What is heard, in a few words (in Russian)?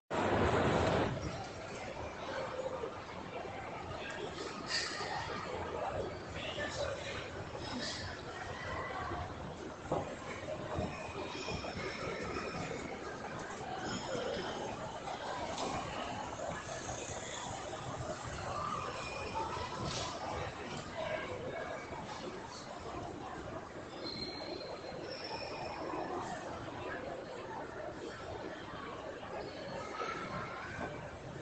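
шум торгового центра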